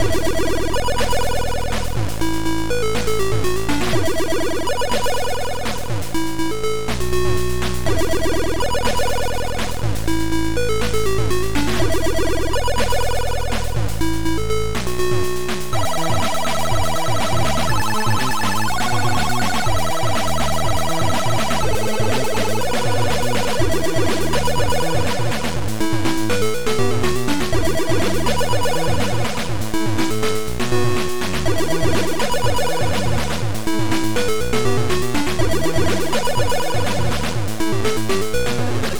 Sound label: Techno